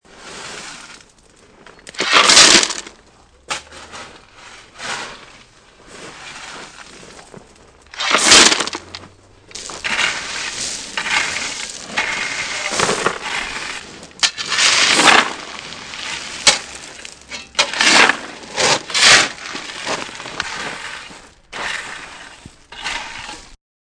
Shovel Gravel, shovel scooping and throwing gravel.; High quality upclose of shovel scooping and throwing gravel.; Digging; Human